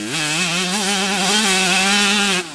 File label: Mid, Engine